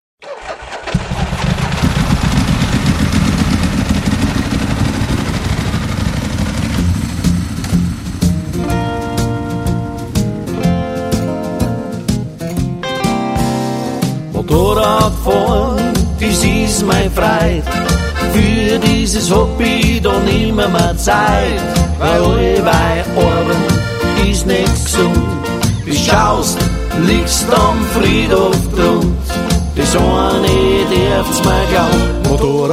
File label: biker blues